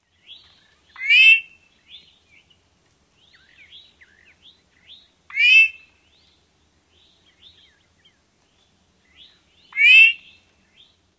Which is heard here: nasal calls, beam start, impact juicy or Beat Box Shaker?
nasal calls